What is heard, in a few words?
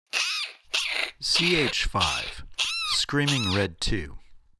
Screaming Red